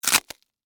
Crack Crunch Short, Texture, Crack, Crunch, Short, Ice, Cream, Cone; Food